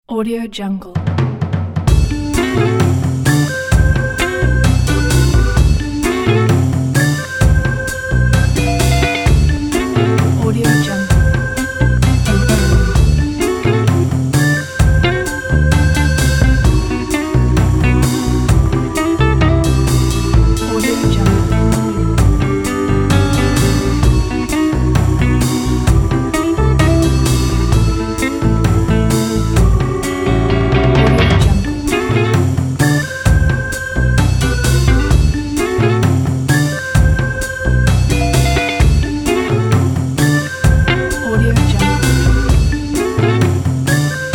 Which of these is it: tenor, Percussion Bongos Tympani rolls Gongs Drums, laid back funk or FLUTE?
laid back funk